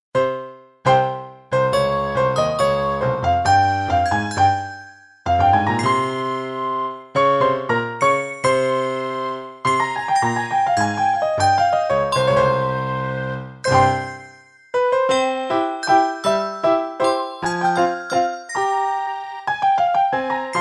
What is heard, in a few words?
piano